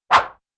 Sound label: swish